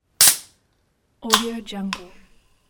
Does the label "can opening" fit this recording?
Yes